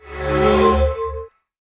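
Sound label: Dark Templar damage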